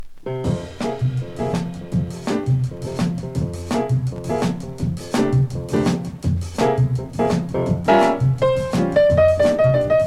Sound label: Latin Jazz Soul Boogaloo